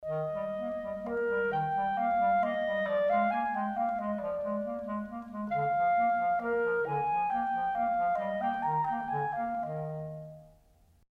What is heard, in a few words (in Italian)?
clarinetti; clarinetto; scuola di musica; strumenti